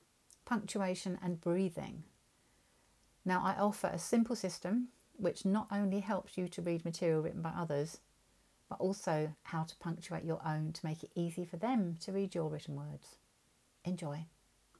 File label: breathing